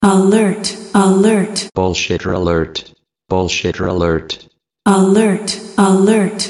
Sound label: Alert